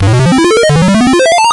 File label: ascend arp